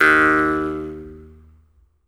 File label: jaw harp, Pitched Percussn, Jaw Harps